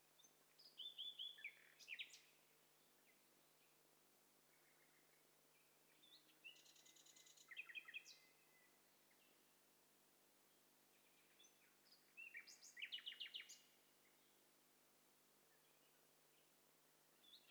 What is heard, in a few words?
STEREO BIRD COCKTAIL PARTY